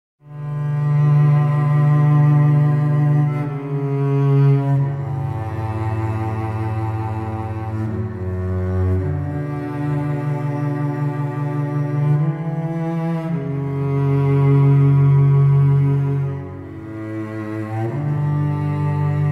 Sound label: The Violin and Cello Sleep, Relaxing Music